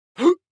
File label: Man having big hiccup